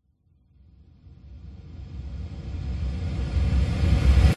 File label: riser mid frequency airy dark light drama; sound design